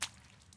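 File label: wet run, footsteps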